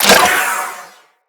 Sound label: Melee Pet Projectile Attack; Sentinel